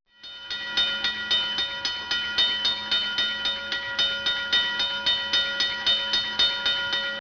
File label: ding; OpenBVE; Railway; Provence